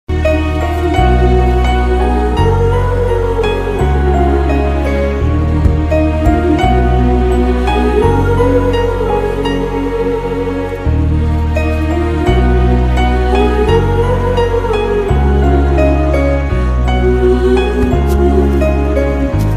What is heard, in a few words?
love bgm, tamil melody bgm; paiya